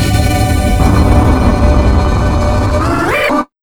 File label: RUN 140 SYN